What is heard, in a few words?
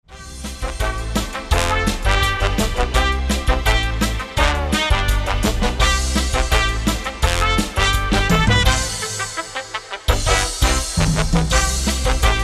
Happy Music